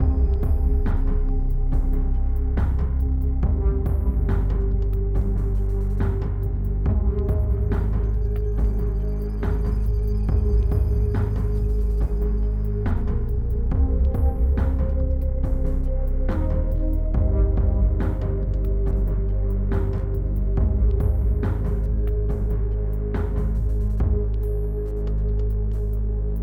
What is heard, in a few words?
Exploration Night 8CH 70BPM